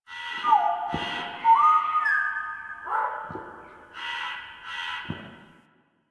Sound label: Drifter Camp Bird; Ambience; One Offs; Birds; Warframe; Quests; The New War